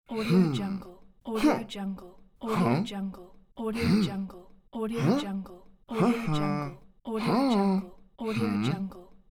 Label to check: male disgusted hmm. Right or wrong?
Right